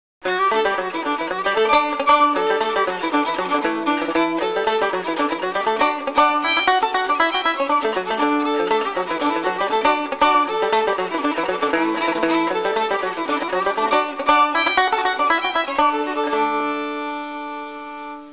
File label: suonerie musica traditional usa country; american